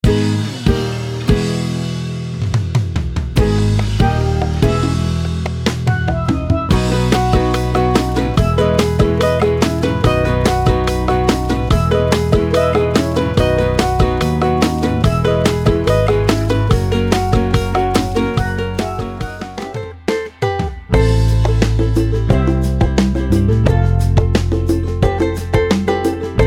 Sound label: ukulele; island sounds 2; bigfishaudio